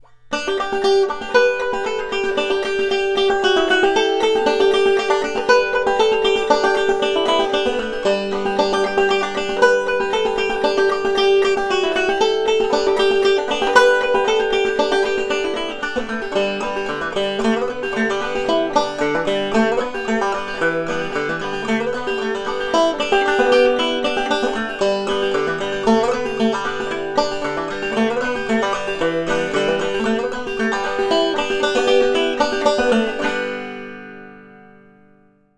Punchy and full tone., banjo, African blackwood tone ring; Maple rim; Bolivian rosewood botom cap; Recordings: M-Audio microphone and Fostex MR16 recording studio; Sandy River Belle